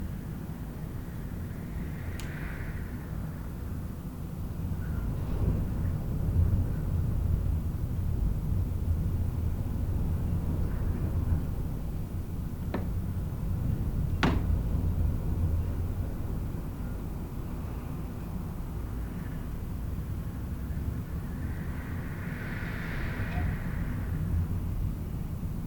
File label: Room Tone Wind